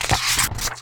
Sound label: Paper Flip